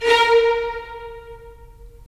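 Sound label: strings violins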